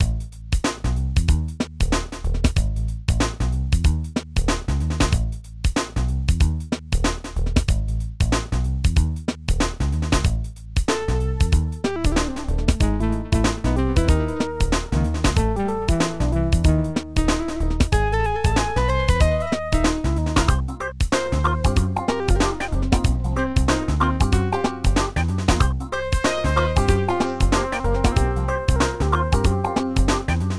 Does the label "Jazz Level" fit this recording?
Yes